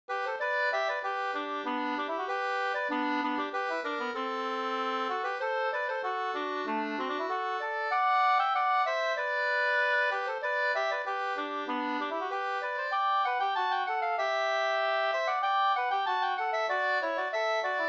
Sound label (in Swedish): snoa